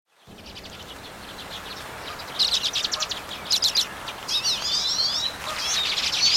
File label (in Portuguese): canto do pintarroxo de queixo preto